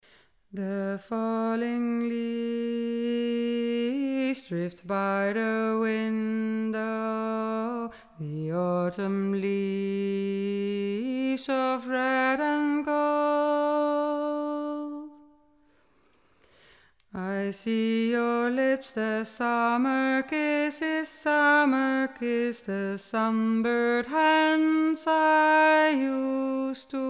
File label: TENOR